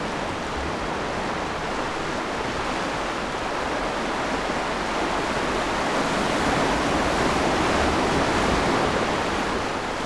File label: waves